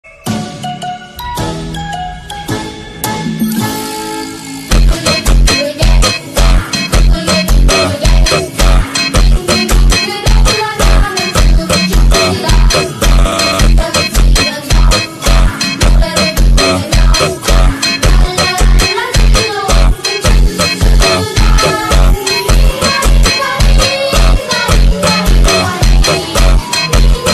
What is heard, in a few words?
Phonk Slowed